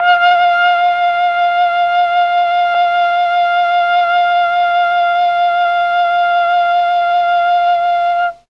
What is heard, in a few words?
F3 mellotron FLUTE